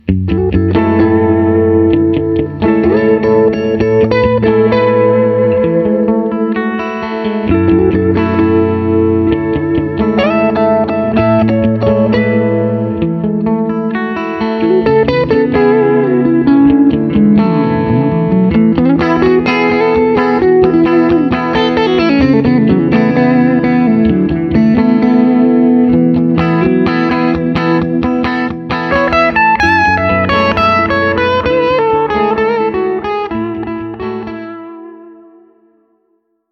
Lead and Backing

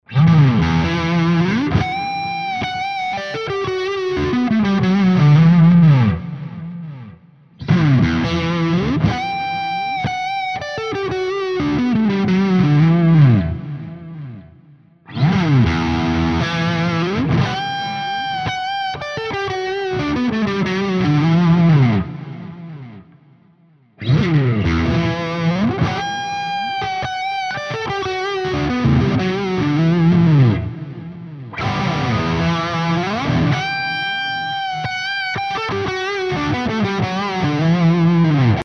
GTRS high gain Lead all positions

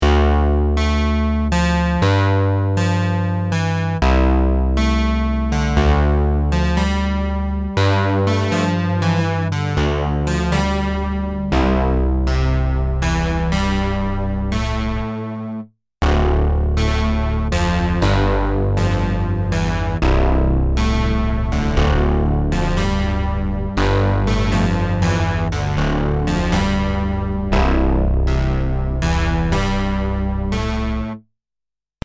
sytem1m fm 1 OSC

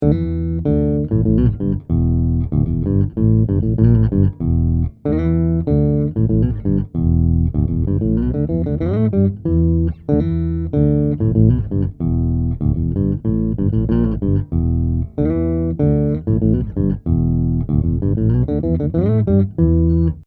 Lo Octave

